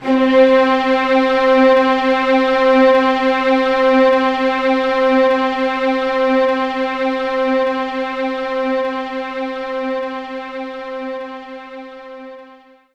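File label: String Loop C3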